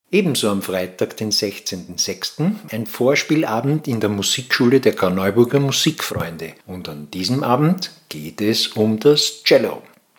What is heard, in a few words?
Cello